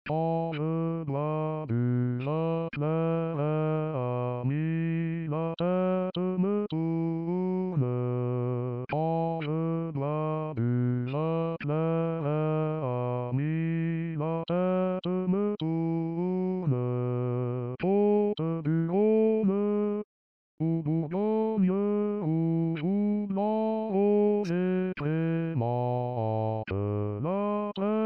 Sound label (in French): basse; l harmonieux vigneron